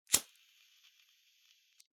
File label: lighter